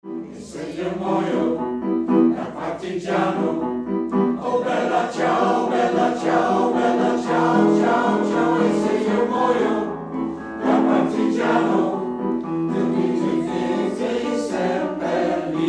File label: Bella ciao, zangkoor